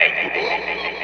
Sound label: VFX, VOX ALIEN; Best Service ProSamples vol.49 - FX-Area; AKAI